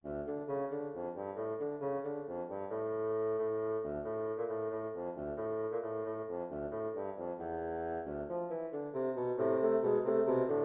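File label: fugue bassoon trio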